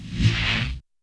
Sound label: Rev Low Noise, YAMAHA RM1X